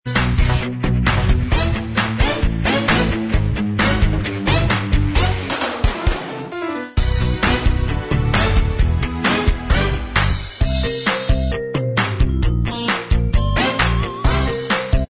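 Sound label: instrumental Track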